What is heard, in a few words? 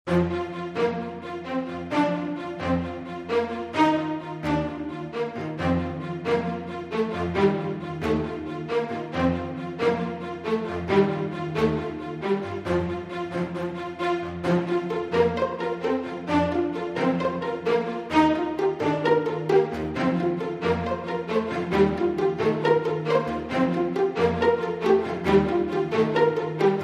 stringing it along